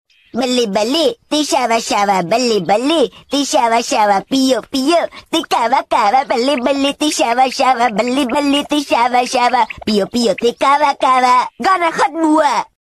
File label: song